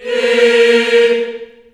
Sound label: A#3, Choir